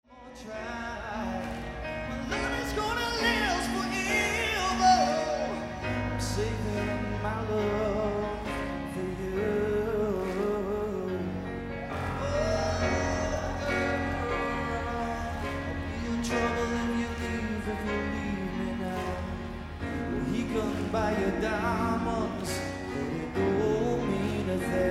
scream

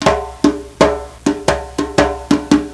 djembe

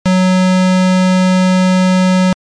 pulse

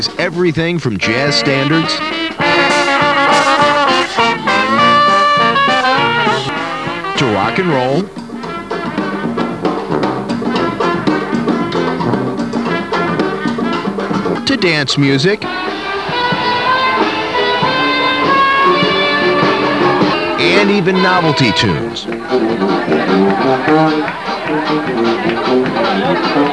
band

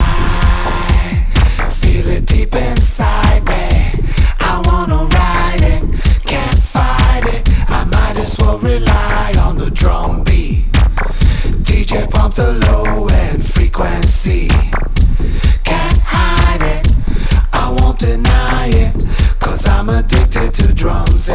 dark beat

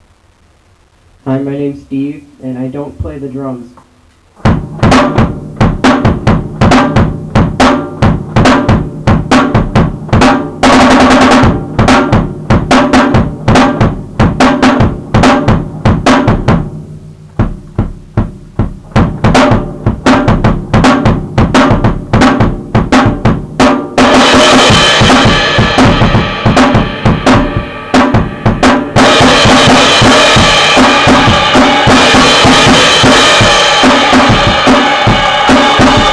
drum solo

